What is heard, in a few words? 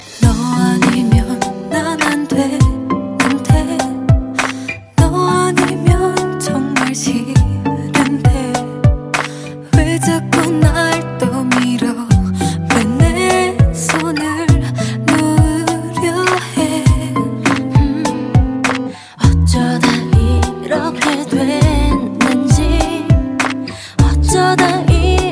ballad